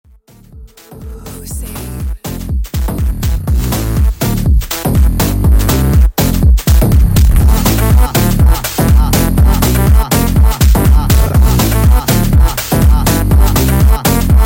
sped Up

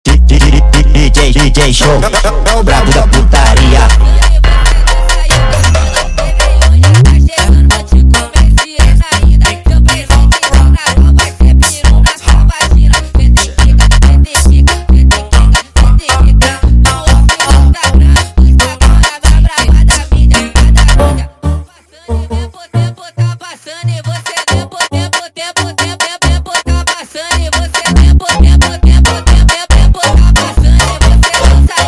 Funk sped Up

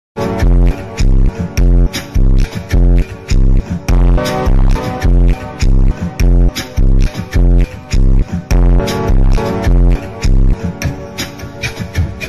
Automotivo Phonk

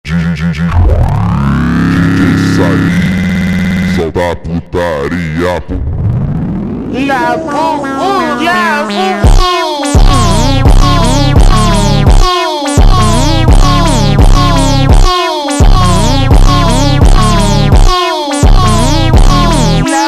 Funk super Slowed

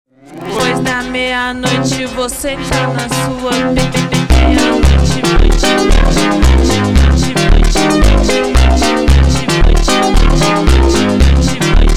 Montagem slowed

